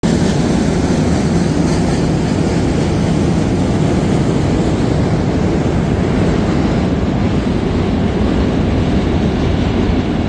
grassy knoll, manhattan bridge noise dumbo pedestrian site visits